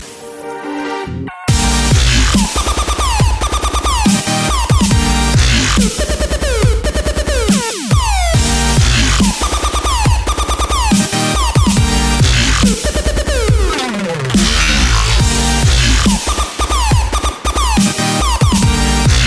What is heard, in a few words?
IOS 8 DUBSTEP